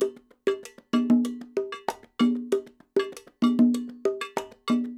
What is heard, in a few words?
Percussionworks; Sampleheads; NYC Percussionworks; 90 s Sample CDs; LOOPS 96